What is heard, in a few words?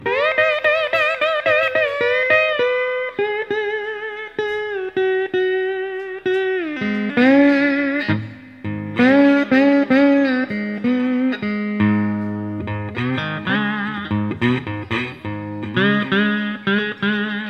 Blues